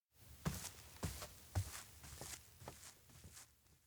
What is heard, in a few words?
steps indoor tatami to washroom; anemoi